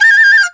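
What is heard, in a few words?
China flute; Spectrasonics; CHINAFLT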